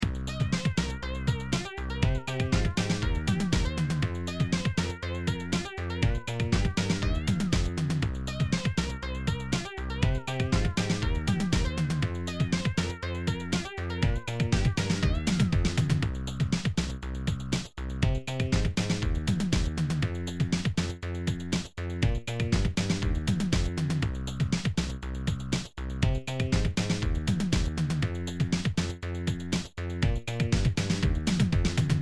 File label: bass basstone lead sound beat